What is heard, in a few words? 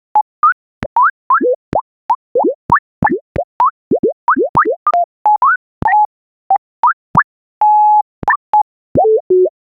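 a small character expressing, silly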